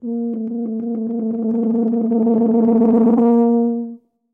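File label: sounds of tuba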